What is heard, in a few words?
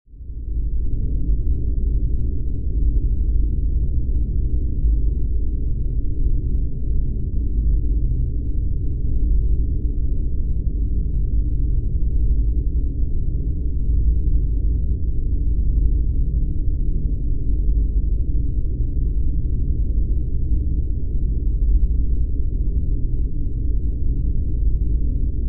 Space Ship Hall; spaceship fx